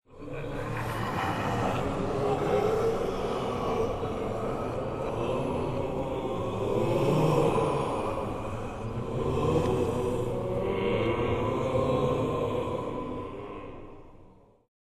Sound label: scream